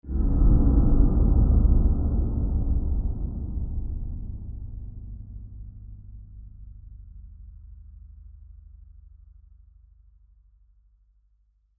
Reverb Dark Swell; Horror, Reverse, Chopper, Build; Scary Soundscape Sound Effects; Scary Soundscapes (Sound Effects:Horror); BlastWave

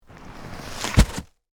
Impact Wood Panel, Drop, In, Snow; Materials

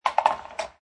Billiard Pool Snooker Ball Falls In Pocket; Lynne Music

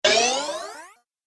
High Rising Hit Sound; Miscellaneous Impact Sound Effects; Andrew Fleming

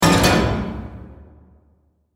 Horror Hit Drum Accent; Production Element; Sound Design

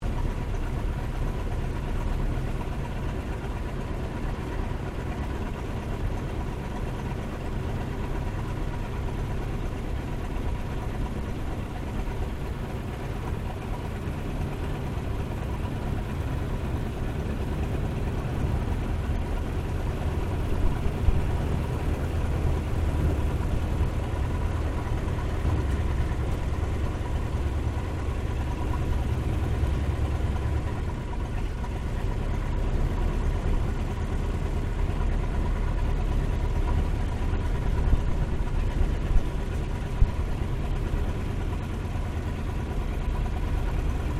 1916 SOPWITH PUP REPLICA TAXI INTERIOR; Replica of a 1916 Sopwith Pup; Airplanes; Vehicles

